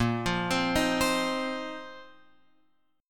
A sharp Suspended 2nd Suspended 4th down Guitar Open F; arpeggiated; A#sus2sus4; JGuitar